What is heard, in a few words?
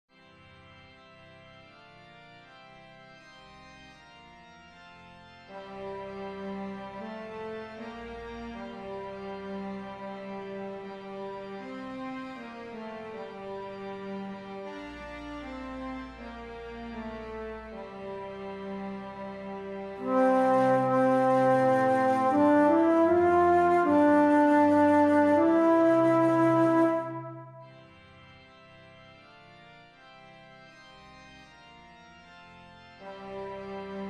Agnus alto; Darke in f